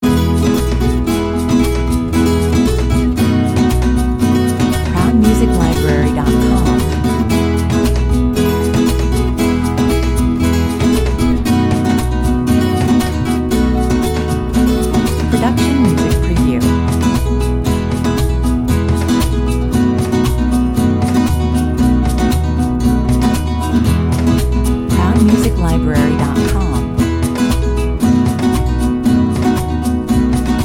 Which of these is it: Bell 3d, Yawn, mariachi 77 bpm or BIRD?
mariachi 77 bpm